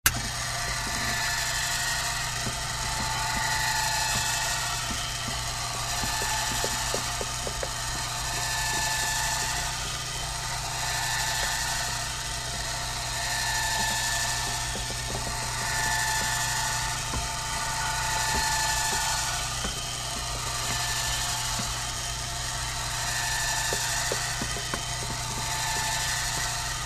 Blue Print Machine; On, Runs With Modulating High Whine, Off